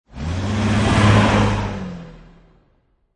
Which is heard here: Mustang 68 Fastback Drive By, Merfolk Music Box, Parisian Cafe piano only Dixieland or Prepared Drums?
Mustang 68 Fastback Drive By